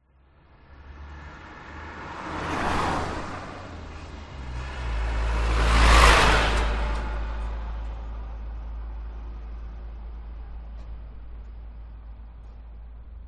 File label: traffic car then truck pass